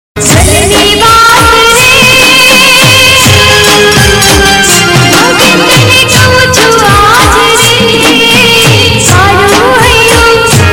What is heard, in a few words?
Gujarati Garba